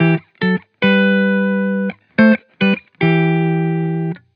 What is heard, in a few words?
Vibrations Pop Guitar Loop 110 BPM E Maj; Cymatics; Vibrations Guitar Sample Pack